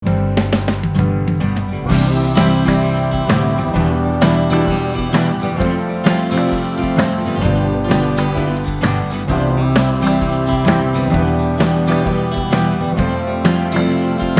(INSTRUMENTAL)

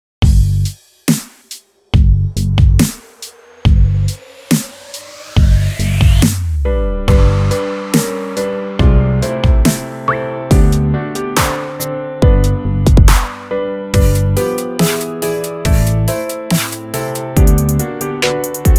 SYNTHS

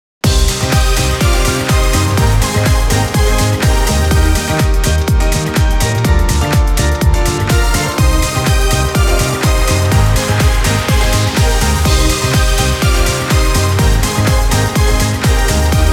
HEARTFIRE (INSTRUMENTAL)

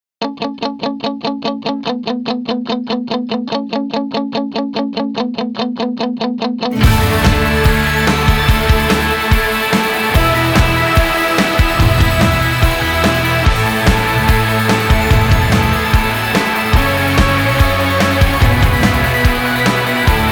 INSTRUMENTAL

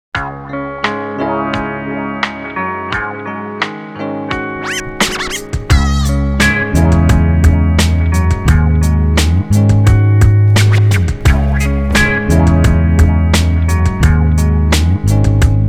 SOUTH CARIBBEAN BEATS

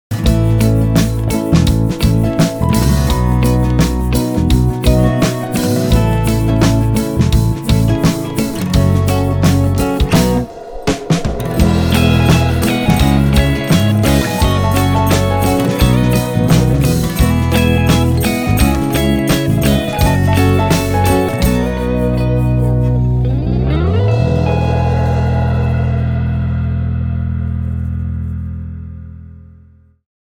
INSTRUMENTAL

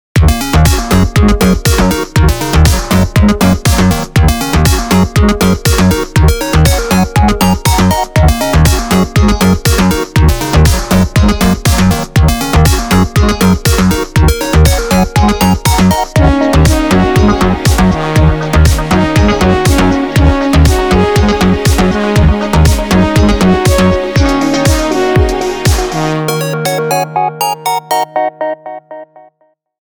disco

